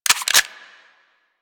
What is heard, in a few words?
Flame Thrower Reload; Warframe; Weapons